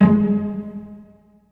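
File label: cello pizzicato G#2, Virtuoso Strings; ILIO; Kirk Hunter Virtuoso Strings VOL-3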